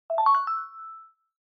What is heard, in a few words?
Smartphone UI Sound Speaker